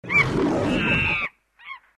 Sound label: start; tempala